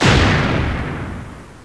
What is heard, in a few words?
nuke mortar exploding